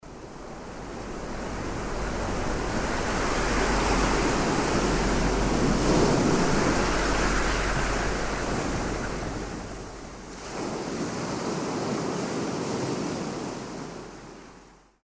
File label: whish Ocean